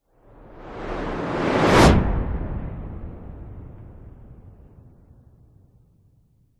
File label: cinematic whoosh fast close doppler; sound design